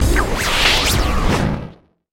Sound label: Stinger; Sound Effects Bible